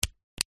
household electric toothbrush switch flick no power